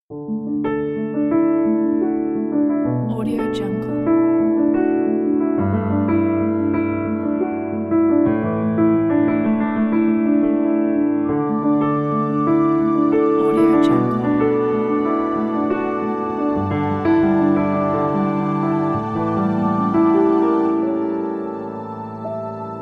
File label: peaceful piano intro; ident